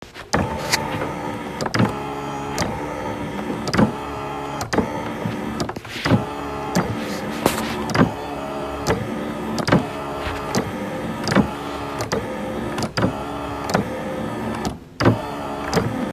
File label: Couch reclining